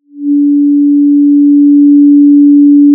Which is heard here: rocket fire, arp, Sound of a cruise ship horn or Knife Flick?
Sound of a cruise ship horn